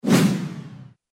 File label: move; cpf painelveiculo